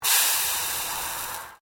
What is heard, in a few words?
Water Sizzling Hot Pan